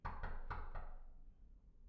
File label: knock; IEEE AASP